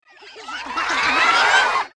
Golf Crowd Buildup

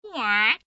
duck howl

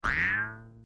toss Banana; KART; toontown-classic

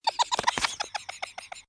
avatar emotion laugh

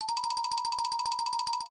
spin miss; toontown-ceiling

